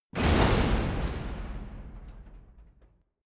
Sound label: metal long; industrie wirtschaft